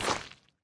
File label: Foot Back; smite; god bakasura